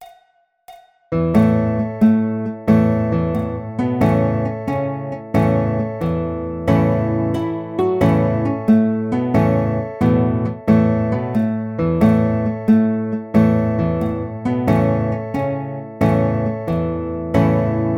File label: Guitar